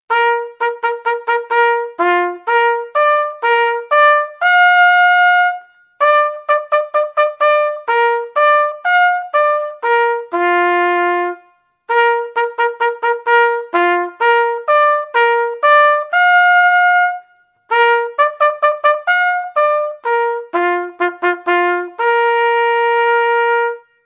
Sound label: The Assembly, bugler